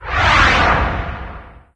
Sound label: Swoosh; candy crush saga gamerip